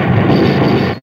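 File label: METAL SPLOSION; FX; AMG - Vince Clarke Lucky Bastard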